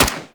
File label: SMG Shot E; Tenno; Sundial